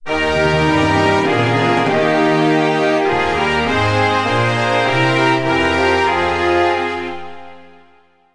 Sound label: Anthem Austria; Buzz! The Mega Quiz; PS2